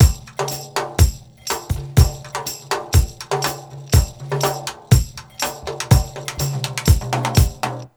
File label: BEAT, Roots Disco; USB Soundscan vol.32; AKAI